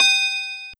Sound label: Bell G6